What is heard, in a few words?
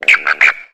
Frog Tree Call Eerie S